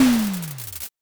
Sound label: firework burst